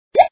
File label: bonus; monster hatch